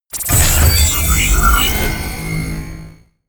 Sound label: sci-fi collect loot medium, hits impacts